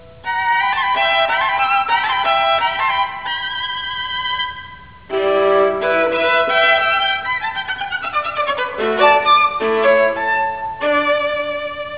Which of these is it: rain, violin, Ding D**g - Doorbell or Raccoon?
violin